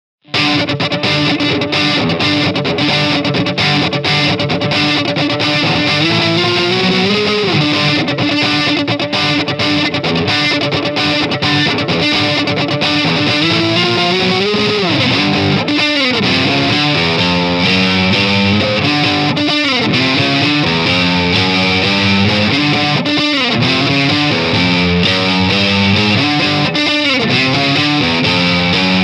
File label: funk; ampvergleich